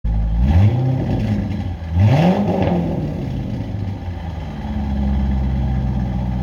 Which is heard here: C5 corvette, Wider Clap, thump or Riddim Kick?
C5 corvette